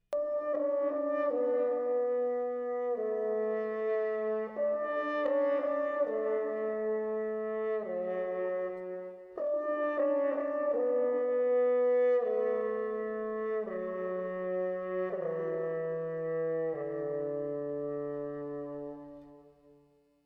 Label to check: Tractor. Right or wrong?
Wrong